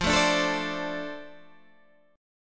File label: F 6th F down Guitar Open D